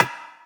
Percussions

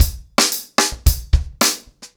110BPM, ACOUSTIC DRUMS

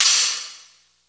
VERB HH, Drums; Distored Reality 1&2

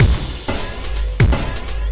Processed Beat, Drums & Percussion

